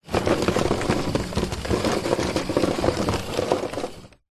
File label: household vacuum cleaner stick dyson off roll head on hard floor